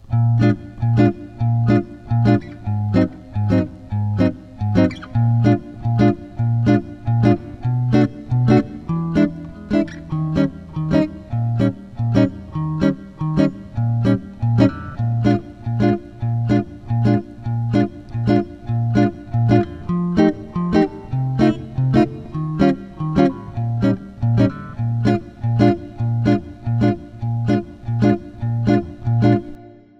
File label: Head Comp, jazz tunes